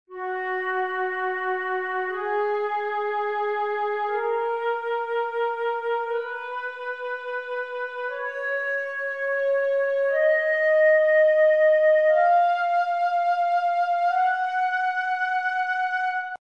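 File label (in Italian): sol bem maggiore, scala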